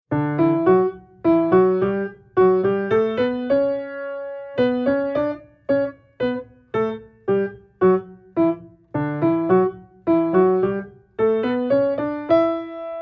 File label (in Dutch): Oefening met parallel octaaf, Piano oefeningen